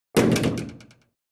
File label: metal door closes